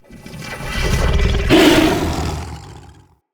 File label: Corrupted Battle Dog Enemy Spotted; Animals; Vocals